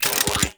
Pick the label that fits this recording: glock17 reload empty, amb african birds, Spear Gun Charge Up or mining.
Spear Gun Charge Up